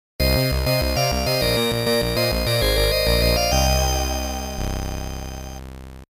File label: Stage Clear; Dracula X The Rondo of Blood